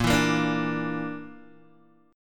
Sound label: A sharp Major Flat 5th, Guitar, Open G